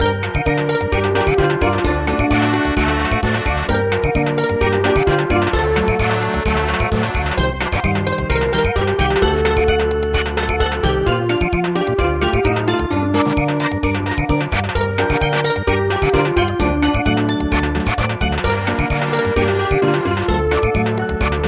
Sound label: Samba